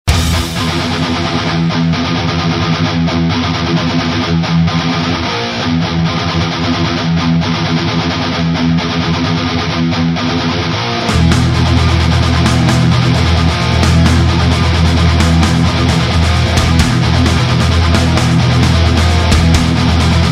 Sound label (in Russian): общий микс с мастерингом; взят с "родного" СD